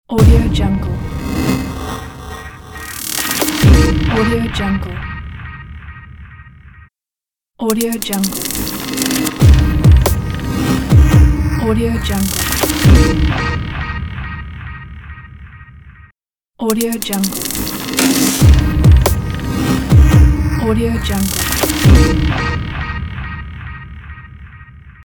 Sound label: glitch logo reveal